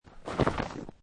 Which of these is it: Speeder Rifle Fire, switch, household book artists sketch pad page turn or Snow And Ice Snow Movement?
Snow And Ice Snow Movement